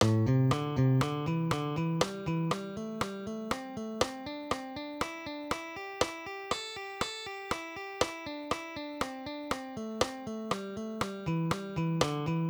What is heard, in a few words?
groups of three